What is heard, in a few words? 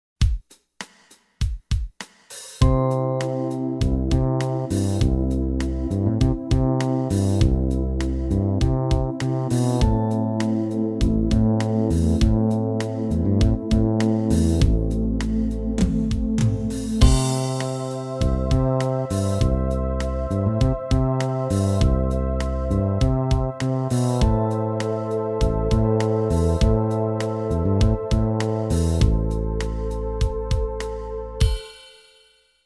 2nd Inversion Study, piano